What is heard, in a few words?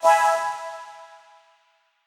Quasar Clear B